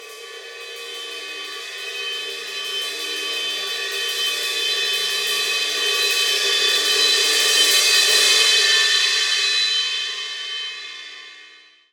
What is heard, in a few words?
Hi Swell